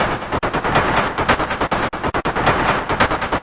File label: 140 noise cutter